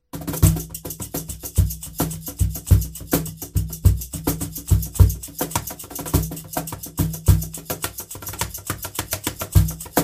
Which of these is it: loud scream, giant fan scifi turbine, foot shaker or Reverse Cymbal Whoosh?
foot shaker